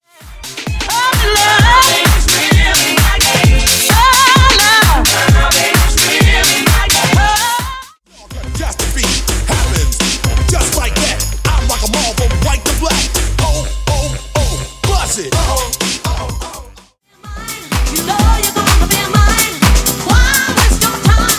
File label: Hip House Classic 130 126Bpm; Megamix